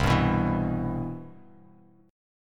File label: C Major 7th, Bass Standard; CM7 strummed; JGuitar